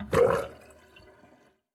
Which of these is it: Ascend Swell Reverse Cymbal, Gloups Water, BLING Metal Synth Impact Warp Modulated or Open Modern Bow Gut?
Gloups Water